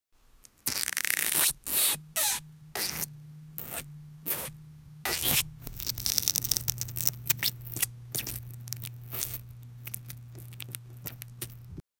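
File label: asmr pomegranate squeezing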